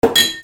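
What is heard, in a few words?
percussion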